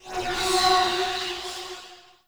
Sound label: Generic Foley; Warframe; Choir